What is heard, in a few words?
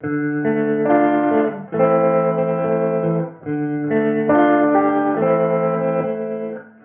Melody Loop - 140 BPM A Min; Melodies; Loop Stems; Cymatics; Oracle Sample Pack; Oracle Various Melody Loop 12